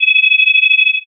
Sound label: forcefield